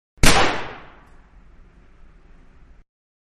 Guns 9mm Semi automatic one shot And Shells Emptying; Pistol; Manhattan Production Music